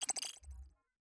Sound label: tech click